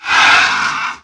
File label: facehugger ouch